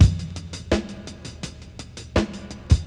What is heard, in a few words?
beats